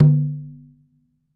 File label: Conga Hit, Latin Percussion, One Shots; Sonic Foundry (Sony Creative Software); Joe Vitale Latin Percussion